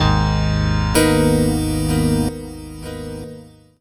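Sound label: Piano Bright stack, Drum & Bass Keys; Sonic Foundry (Sony Creative Software); Knutrix Drum & Bass; Keys Pads 1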